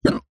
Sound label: human male hiccup